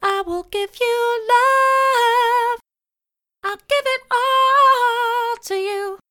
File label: Vocals Dry; sidechain compression in ableton live